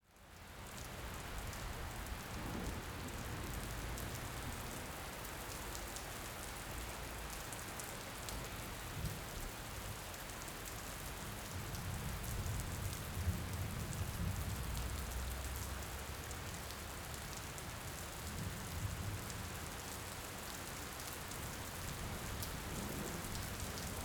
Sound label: Ambience Rain Loud; AdobeCC